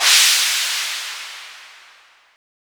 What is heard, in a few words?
Crash, EDM; Sonic Foundry (Sony Creative Software); Afterhours EDM